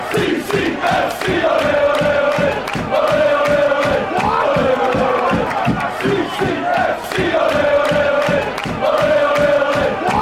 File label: ole ole ole, fan chants; ccfc